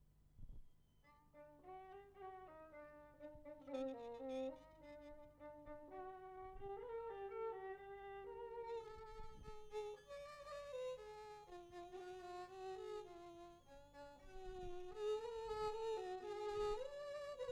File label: Violin Duets